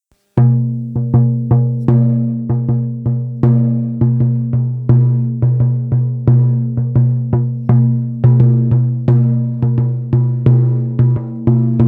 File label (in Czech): thunderdrum palicka; samansky hromovy bubon 40 cm